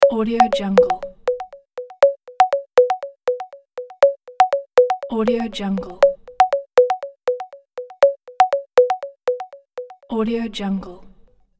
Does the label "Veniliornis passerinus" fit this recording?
No